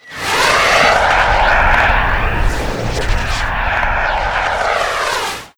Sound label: rocket launcher projectile; gesource; xshemps customs; weapons